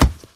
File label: Hit Impact